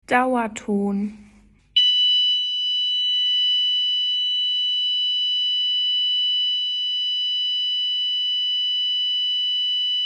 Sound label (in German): Dauerton 990 Hz